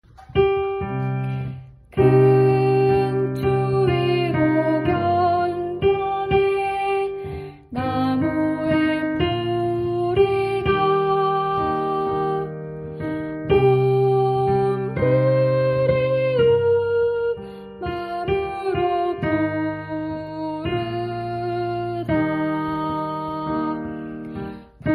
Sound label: fin basse, Une belle patrie en coréen